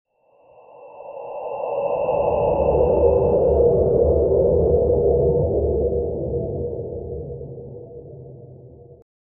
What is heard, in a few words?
dark atmospheric track; dark ambient